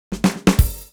Fill Ins 128BPM; Vengeance Samples; VEH2 Loops

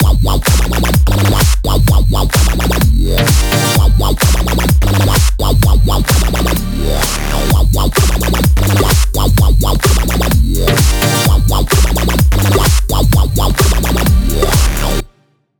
128BPM Wobble Mix; Vengeance Samples; Wobble Kit

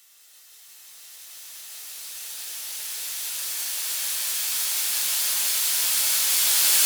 140BPM Heavy Noise; FX Fills; 4Bars; Vengeance Samples

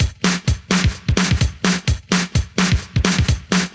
House Beat, 128 BPM

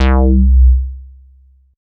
Synth Bass; Vengeance Samples; VEE Synth Shots

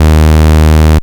Root D#, Bass

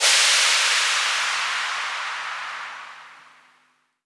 Crash 140BPM; D minor; Drums & Perc; Vengeance Samples

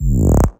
Bass Cuts; Vengeance Samples; VES1 Instruments

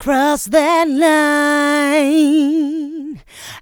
voc, female vox, Vocal House; PS-28 Vocal House Samples

